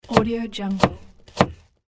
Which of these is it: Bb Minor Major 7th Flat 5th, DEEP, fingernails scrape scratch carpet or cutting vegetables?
cutting vegetables